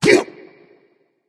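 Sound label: nonverbal clear throat; smite; voicepack; merlin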